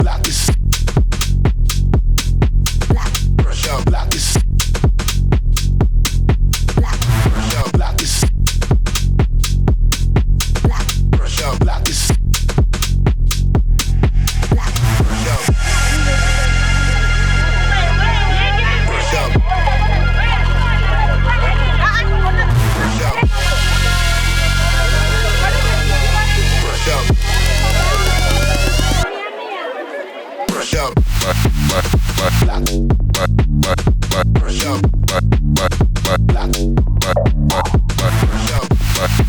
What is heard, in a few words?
workout music dance workout house mix